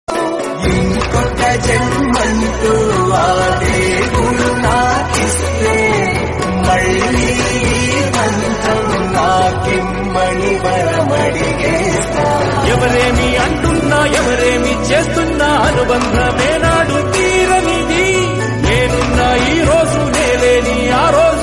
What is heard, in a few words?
friendship telugu; maga maharaju